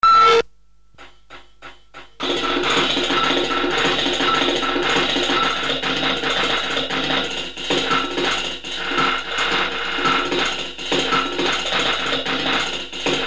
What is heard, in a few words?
instrumental; thrash avantgarde